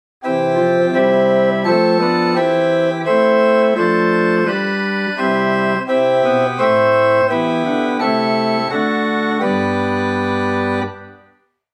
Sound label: Prinzipal + Oktave + Sesquialtera, organ stops; Grace Lutheran Champaign